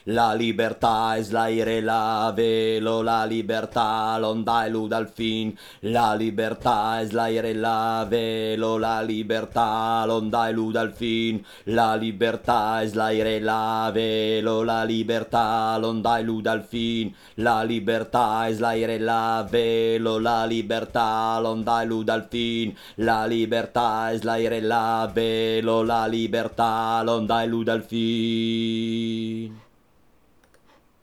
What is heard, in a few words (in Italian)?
finale bassi; Joan Peirol